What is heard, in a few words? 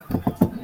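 knocks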